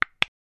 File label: Buton; freeroam